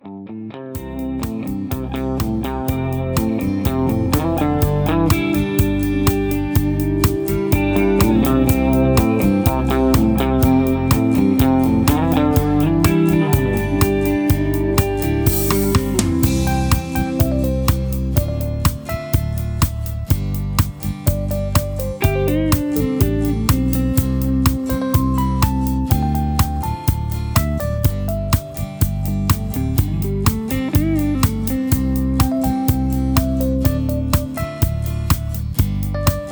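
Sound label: Instrumental Melody with background vocals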